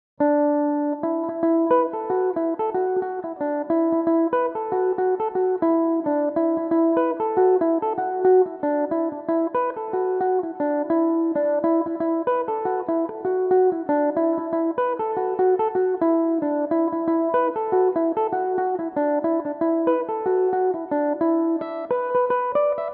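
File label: jig, E dorian, mandolin; Gan Ainm 23; Belmuse EM-190 electric mandolin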